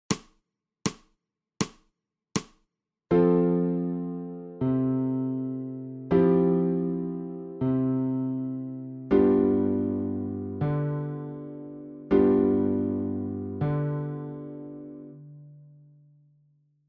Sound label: ALTO